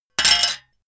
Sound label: metal plate thin drop